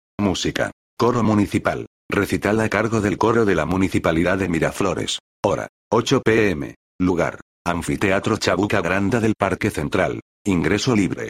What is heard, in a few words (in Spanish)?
musica coro municipal